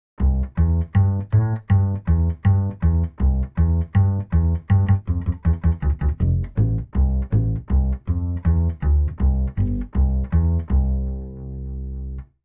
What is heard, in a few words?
Double Bass sample